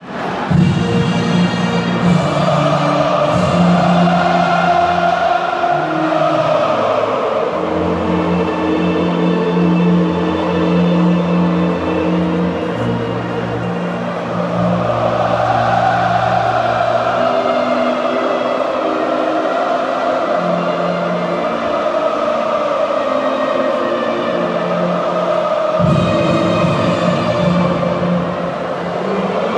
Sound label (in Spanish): himno nacional